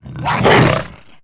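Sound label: kinrath hound attack; Star Wars Knights Of The Old Republic I; XBOX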